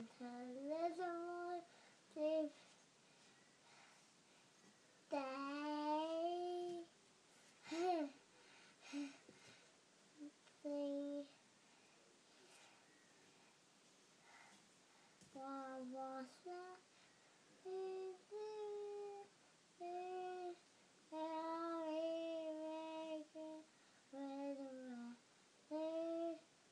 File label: singing in bed